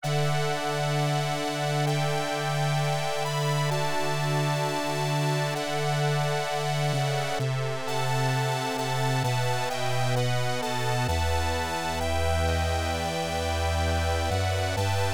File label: All Clear; D Return; X68000